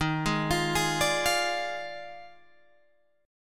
D sharp Major 7th Suspended 2nd Suspended 4th, down, Guitar, Open G